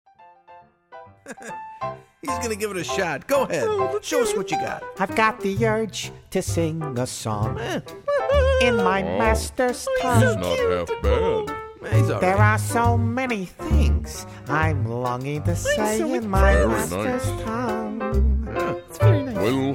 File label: Four Dog Song